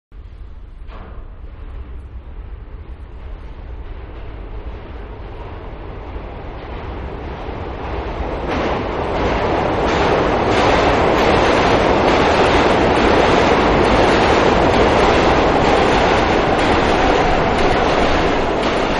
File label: Subway-In Tunnel-Ambience, Exterior; Subways; Vehicles; Manhattan Production Music